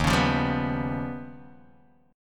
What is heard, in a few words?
Eb Augmented 9th Eb down Guitar DADGAD